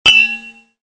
LID PING

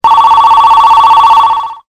incoming voice msg

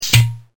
POP

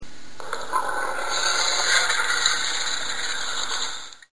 Camel

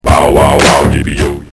bowwow

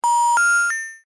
dial tone

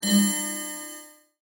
clock

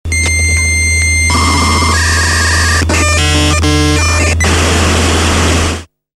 modem sound

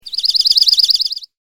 junco

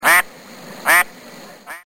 duck

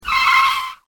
CAR SKID

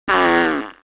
fart

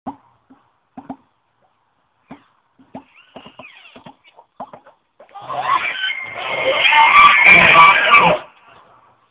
double bugle

